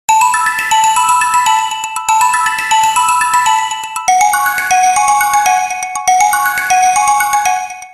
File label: Melody, RingTune